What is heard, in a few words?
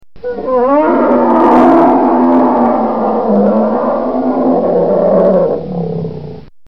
Polar Bear Mad Sounds Car Horn